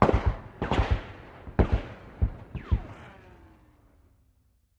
.50 Caliber: Firing, Distant With Close-up Bullet Ricochet